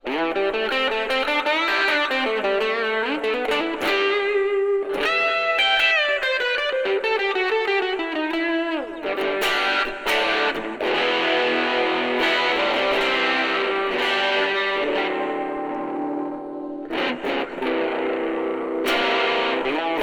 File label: distortion chorus reverb; fluid audio strum buddy test